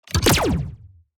Sci-fi weapon laser gun shot

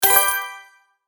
Money notification chime purchase sale

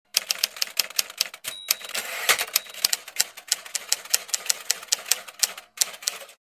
Electric typewriter typing with return bell

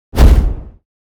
Vampire vanish dark dissolve

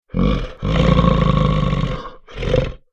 Bear growling and snarling; animal

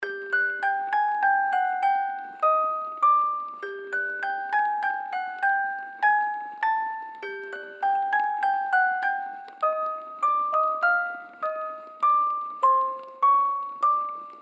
Eerie music box suspense horror loop

